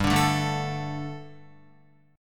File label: G Suspended 2nd G down Guitar; strummed; 4F,4A,5C,5G,5C,6E